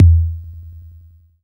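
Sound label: bd, 808 bass drum; Best Service Gigapack 2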